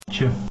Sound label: tsch